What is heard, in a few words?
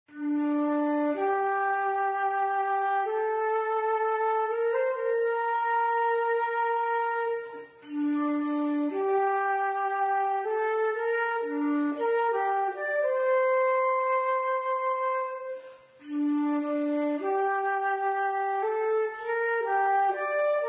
Flute; Zomboid; Lifestyle